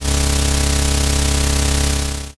weld

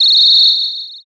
whistle long

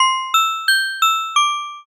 music box

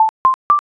loading

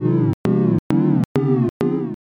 laugh crowd

